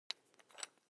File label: object tool electric drill bit unfasten wrench turn